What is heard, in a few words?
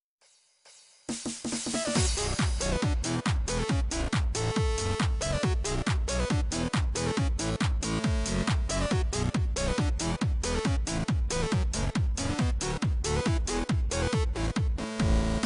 Nokia Tune REMIX